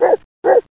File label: Barks; Cheesy Lo-Fi Sound Effects